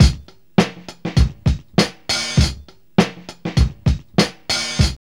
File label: LOPER LOOP 100, Funky Elements loops; Zero-G